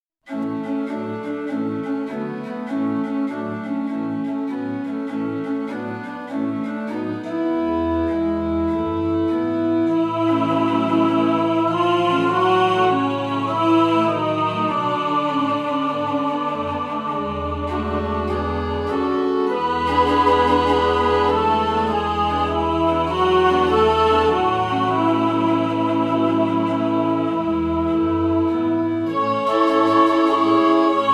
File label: Just a closer walk with thee organ version